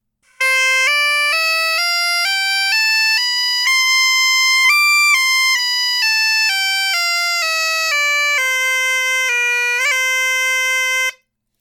punteiro c tonleiter

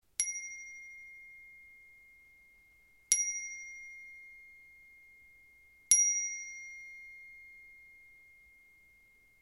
klangstab einfach

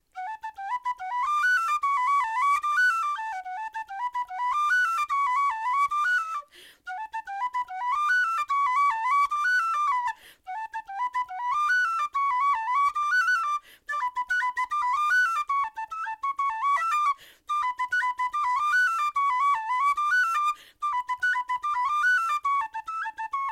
tinwhistle melodie

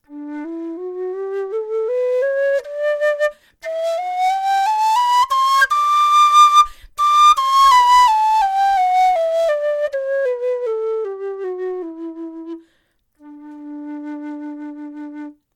dixon lowwhistle alu tonleiter; Die Low Whistle ist die tiefere Variante der irischen Tin Whistle

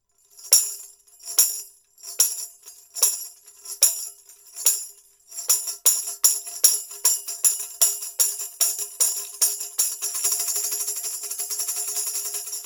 tambourin

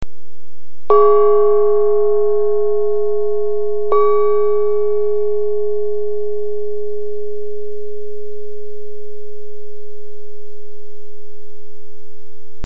tischgong

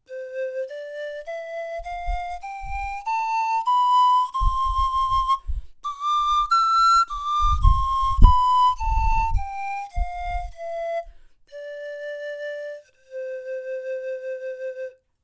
pan klein tonleiter; Panflöten

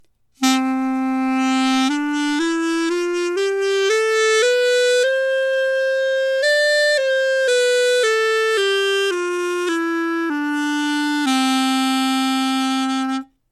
chalumeau tonleiter

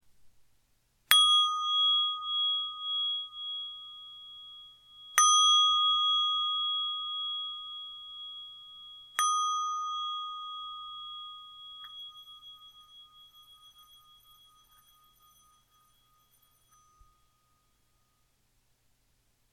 klangschale jap 69mm

